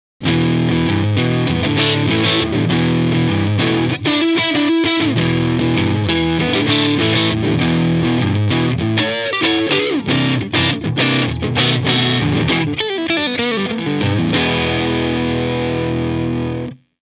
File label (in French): Distorsion Sustainer; pedales; D&SII